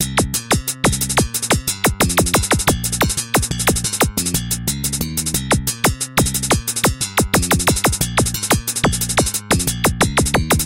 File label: hover, chiptunes